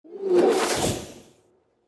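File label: as if in a pipe with a swirl; Звук ветра для перехода в видео или для монтажного перехода в аудио монтаже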